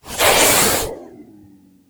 injured

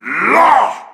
shout middle

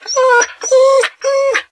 hurt, dog

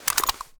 ammo pickup

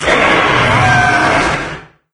death, wraith; doom3

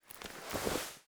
weapon holster

